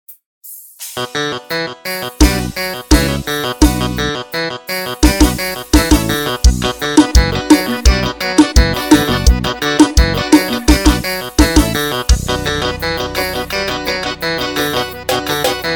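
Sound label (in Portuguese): teclado brega funk com piseiro playback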